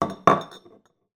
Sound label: foley bottles x2 beer empty put down on table; zapsplat